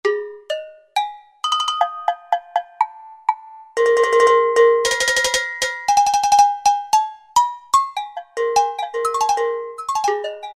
Cowbell; World; Roland SR-JV80-05

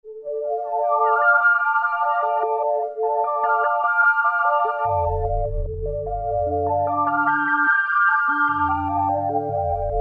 Filtered Arpeggio, Korg PolySix; Tweaks

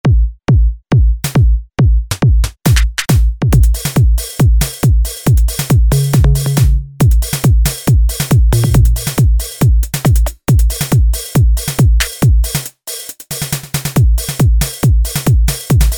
DRUM KITS Techno Kit; Apple GarageBand 2

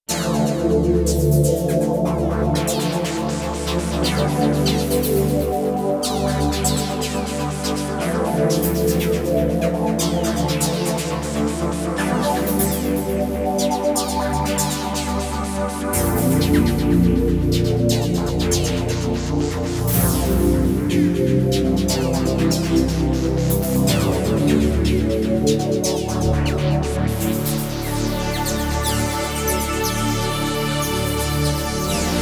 Flea Comb, Korg Radias-R

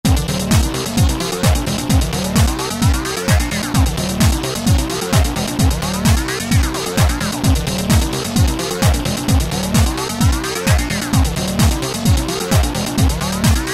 Korg ES-1 Factory Patterns

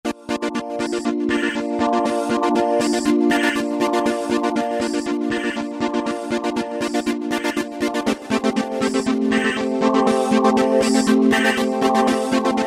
Synapse 2, Roland V-Synth

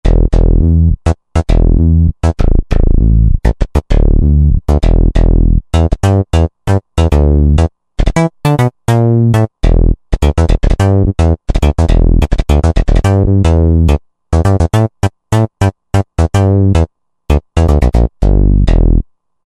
Rubber Bass, Roland JV-2080

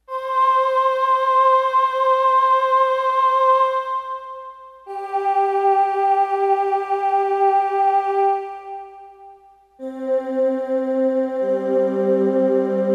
Voxy Women Sing, Roland D-10; Factory Patches

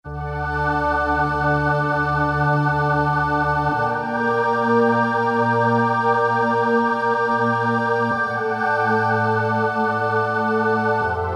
Vox n Sawz, Roland PN-D50; Roland PN-D50-03

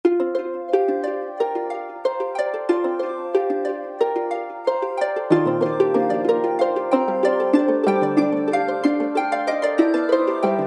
Nooage Insta Harp, Kurzweil K2000; Kurzweil K2000 Orchestral ROM

